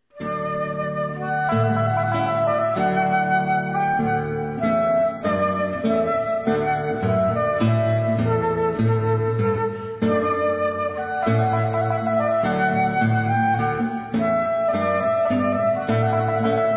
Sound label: Trumpet Voluntary - Flute and Guitar